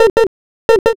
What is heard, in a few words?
frog